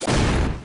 Slam; pokerogue; battle anims

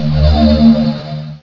Magnet Bomb; pokerogue; battle anims

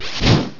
Fire Spin; pokerogue; battle anims

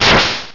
Sonic Boom; pokerogue; battle anims

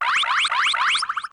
Uproar; pokerogue

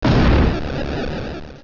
Blast Burn; pokerogue; battle anims

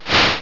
Sucker Punch, battle anims; pokerogue

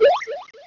Pluck; pokerogue; battle anims

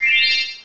Health Up; pokerogue; battle anims

